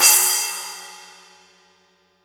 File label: CYM, Real Drum Kit; Best Service ProSamples vol.43; JUNGLE KIT